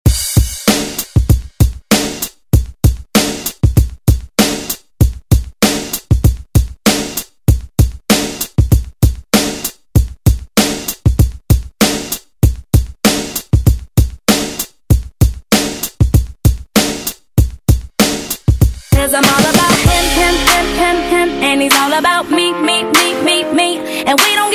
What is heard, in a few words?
Hit Pop Redrum; Deejay Hit